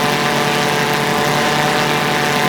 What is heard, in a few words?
loop; redbaron; LoL; corki